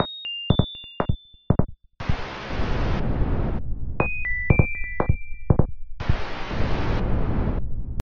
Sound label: flow koto ringtone